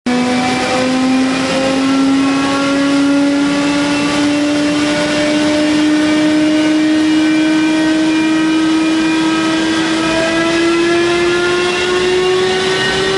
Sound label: spey start, Phantom FGR.2; FGUK